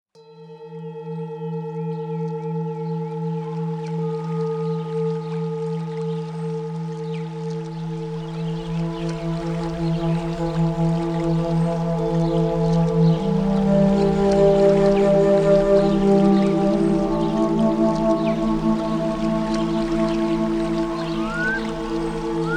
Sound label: Silent River 333 Hz